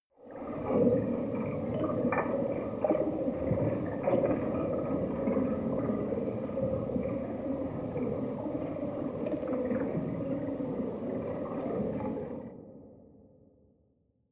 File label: water fx